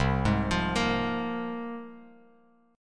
C 7th Suspended 4th Sharp 5th, Bass Standard; C7sus4#5 arpeggiated

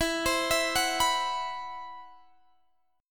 C Major 7th Flat 5th E; CM7b5/E arpeggiated

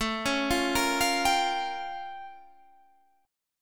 A Augmented 7th Flat 9th A; A+7b9 arpeggiated; 1,3,sharp5,b7,b9

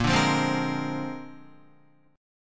Bb 9th Bb down Guitar 4F,4A,5C,5G,5C,6E; strummed; JGuitar

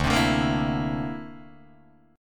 D 7th Suspended 2nd Sharp 5th D, Guitar DADGAD

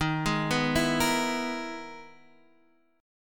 E-Major Sharp 11th Eb

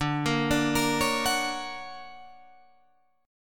D Augmented Major 7th down Guitar Drop D

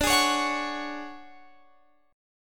D Major 7th Flat 5th D down Guitar Open F; DM7b5 strummed

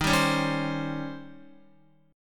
Eb 13th, Guitar; strummed; x,6,5,6,7,7; 4E,4A,5D,5G,5C,6F

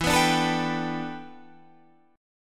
E Minor 11th E down Guitar Standard; Em11 strummed; JGuitar

